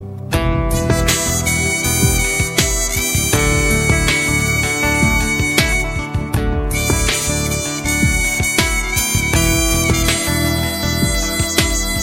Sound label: Sad Tone; Kaante